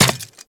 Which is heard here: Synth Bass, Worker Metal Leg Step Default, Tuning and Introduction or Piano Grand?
Worker Metal Leg Step Default